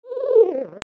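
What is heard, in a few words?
short sound of cute cooing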